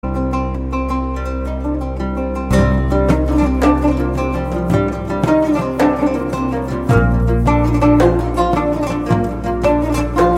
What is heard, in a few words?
Ringtone Arabic